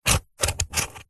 office marker pen draw triangle shape on dense foam or polystyrene; zapsplat